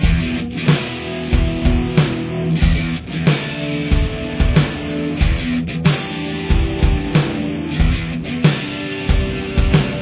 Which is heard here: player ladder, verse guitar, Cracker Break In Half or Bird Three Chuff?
verse guitar